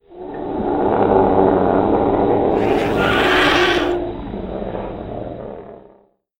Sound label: condor loop; ambient; morass beta